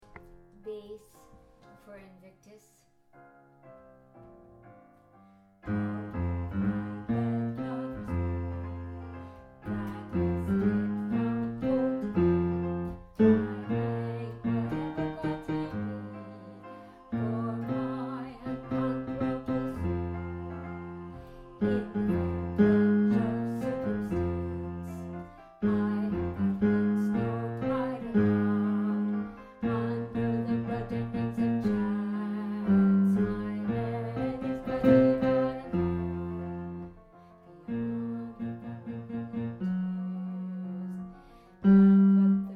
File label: bass; invictus